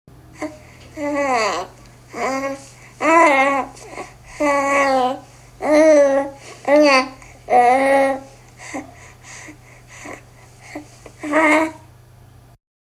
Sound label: cooing sound; These sounds are in short bursts at low pitch that are made toward the back of the throat; contented/settled mood